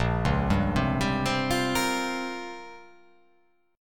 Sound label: Bb Minor 6th Add 9th Bb down; arpeggiated; JGuitar